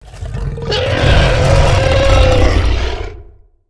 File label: rancor; Star Wars Knights Of The Old Republic I; XBOX